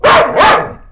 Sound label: LOUD DOG, tono di notifica cane